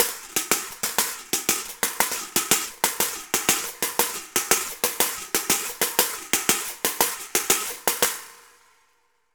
Reco Reco Samba 120 bpm; Best Service; Festa Latina VOL-1

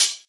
Open Hhat, HiHat; Equipped Music; Loungin House

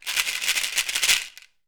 SHEKERA, African perc

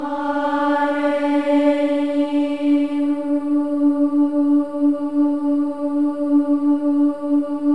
AH EH, Voices; Spectrasonics; Symphony of Voices; AH-EH-EE

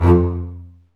STR CB, Cb Bowed, Cb Marcato; String Master Series

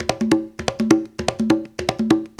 100 TAP, Afr.Congas; Spectrasonics; Supreme Beats; African Contemporary

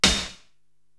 SD Industry, Korg TR-Rack drum machine

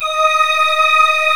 FAIRLITE G5; Northstar - New Gold VOL-1; M1 FAIRLITE

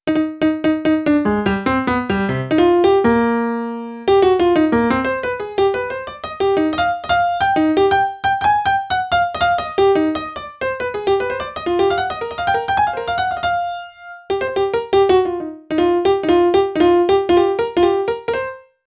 Piano Solo, Cinematic Funk

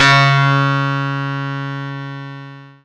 C#, Synclav FM PLUCKED; Big Fish Audio

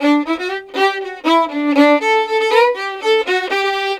violin, Celtic Flavours

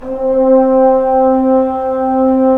BRS Tbns; Roland - LA Composer vol. 4

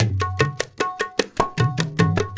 INDIA R, Heart of Asia, IND ENS

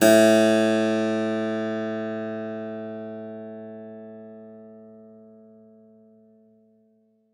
SPIN G#2, SPINET; Xsample VOL-7

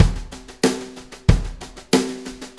Brushes 16ths Snare, Snare Loops; Sonic Foundry; Sony Creative Software; Loops & Samples Drum Tools

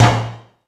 Toms 1, drum machine

